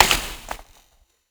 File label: hit, Processed Foley; Sound Design Tools